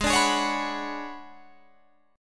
B Minor 6th Add 9th G sharp

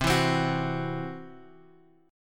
C-Major Flat 5th, Guitar Open G

